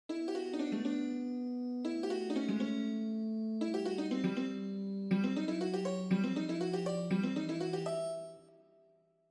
dulcimer

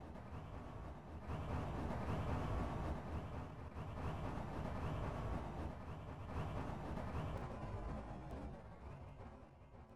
explosion

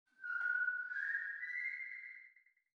bird

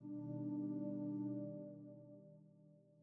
hum

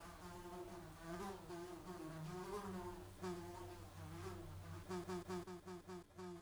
insect

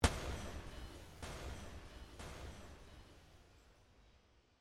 explosion

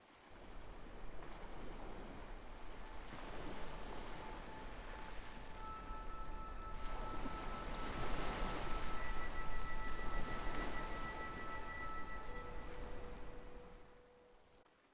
waves

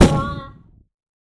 bang

